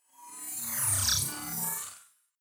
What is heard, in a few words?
swell short metal string computer